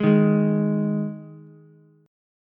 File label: Ab 5th Ab Ukulele; strummed; JGuitar